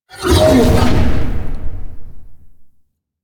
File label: Horse Glide Wing Flap Flight Boost; Vehicles; Duviri